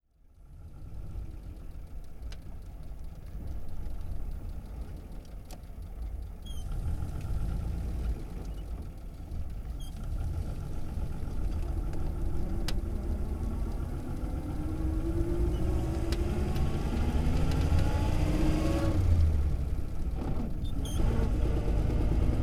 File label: 1937 Plymouth Int Fast & Decelerate, Period Vehicles; Sony Pictures Sound Effects Series Volumes 1-10; Sony Creative Software